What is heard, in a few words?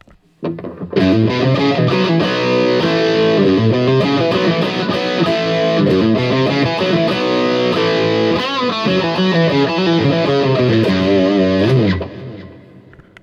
Guild Bluesbird Bridge Backline, guitar; 2016 guild newark street bluesbird guitar